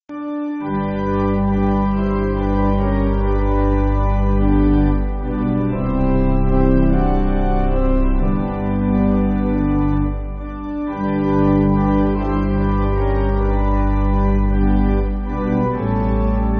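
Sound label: Pipe Organ, Backing Files for Churches; Mim Lam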